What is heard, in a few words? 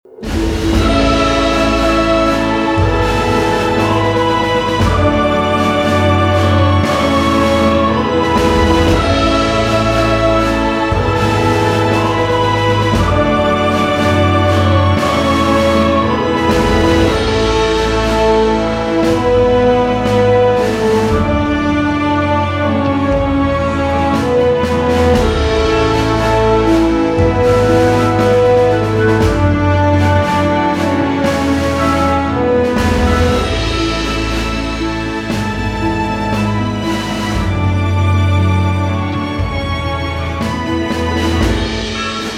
Patriotic Anthem; Awarding